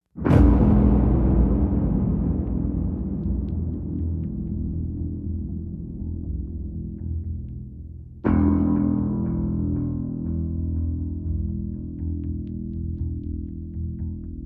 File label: Innerscope Synths And Perc; Ninja Tracks